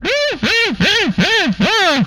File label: Laugh, Vocal; retro arcade